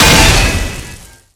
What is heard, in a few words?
impact generic